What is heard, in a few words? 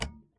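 button up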